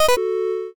eliminate

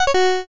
connect fail

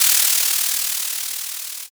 soda

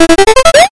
picoquestion

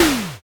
water balloon

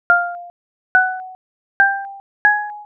cave drip

